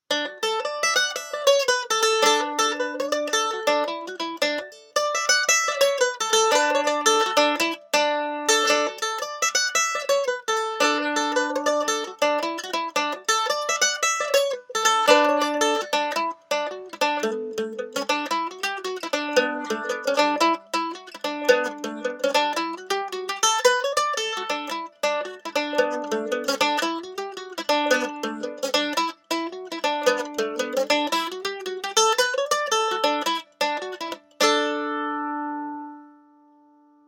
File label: Mandolin Pick Comparison